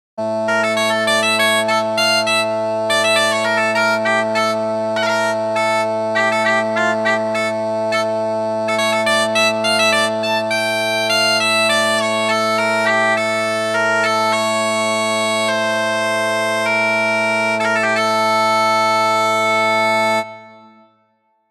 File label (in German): Dudelsack